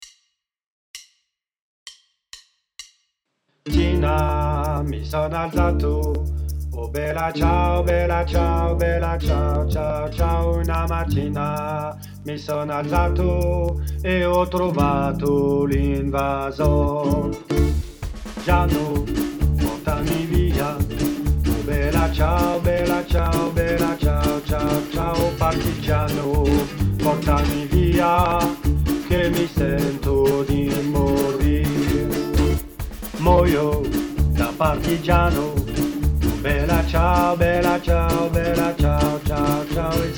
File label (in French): Bas; bella ciao; chorale